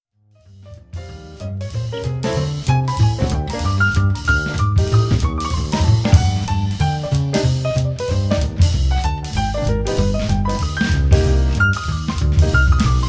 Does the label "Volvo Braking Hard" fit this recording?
No